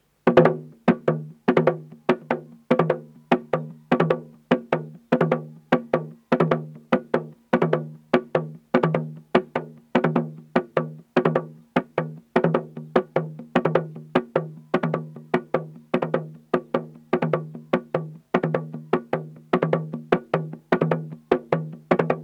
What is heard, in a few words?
Engalabi, uganda